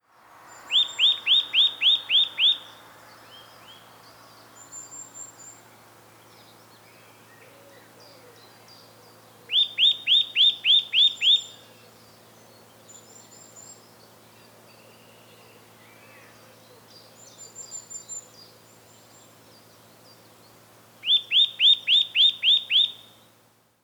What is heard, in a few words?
Eurasian Nuthatch Sitta europaea; chant des oiseaux des jardins